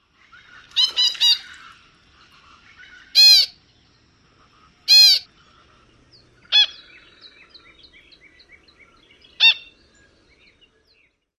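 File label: nasal calls, least grebe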